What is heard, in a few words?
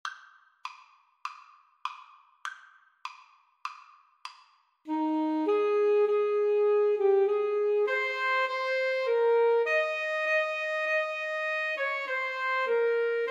Alto Tenor Sax Duet